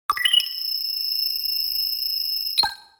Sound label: Futuristic data calculations medium